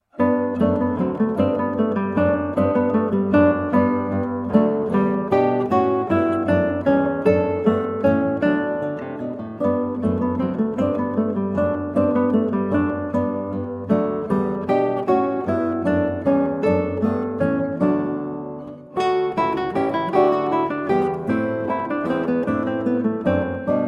Minuetto from Partita 2 in G, Guitar

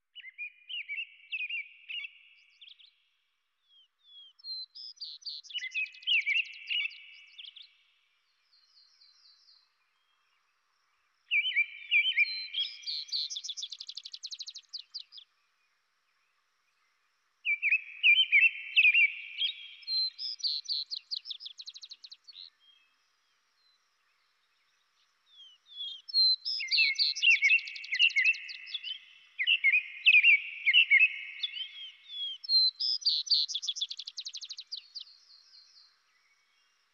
Robin and Vesper Sparrow

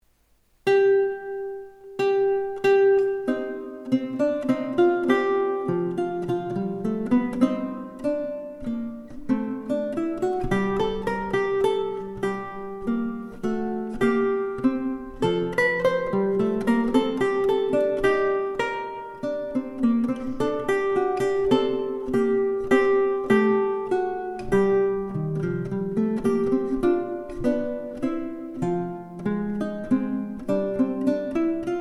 Recercar Duo, Lute; Il Rosetto, Domenico Bianchini's Lute Book (1546)

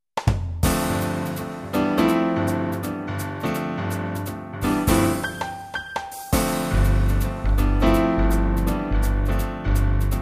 SD-90 Piano; SD-90 MIDI

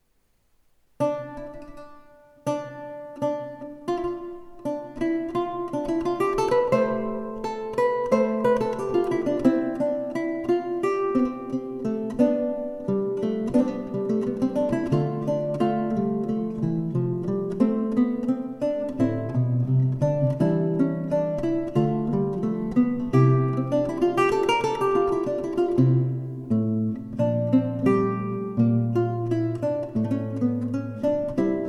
Recercar, Lute; Il Rosetto, Domenico Bianchini's Lute Book (1546)

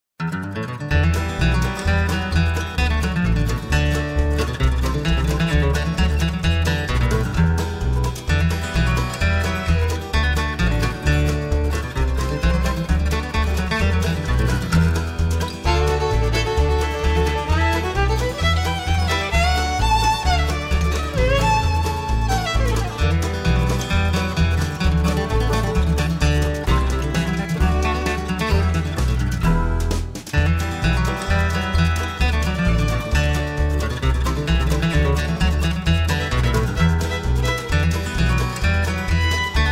COUNTRY

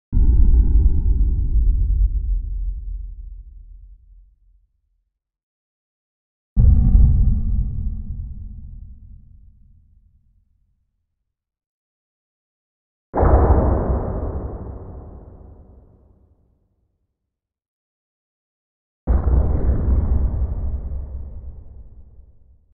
Bass impact - Deep sea x4; Hollywood Edge Sound Designers Tool Kit

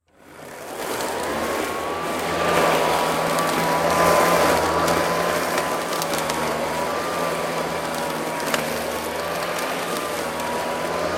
Electric Mower; 100 sound effects

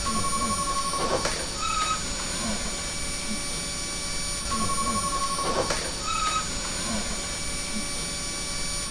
service room, room noise; 1000fx

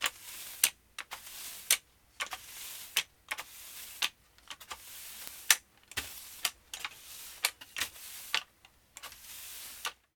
BLOOM, DUSTING; 1000fx

